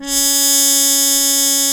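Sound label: C#4, mute trumpet; AKAI S-Series CD-ROM Sound Library VOL-2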